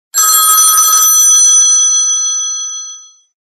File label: Telephone; Coolpad